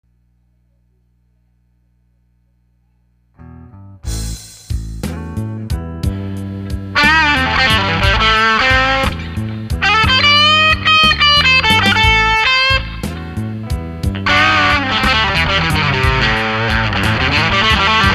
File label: strat